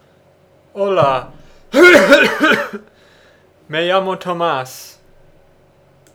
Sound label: Cough